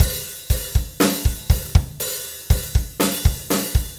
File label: DRUMLOOP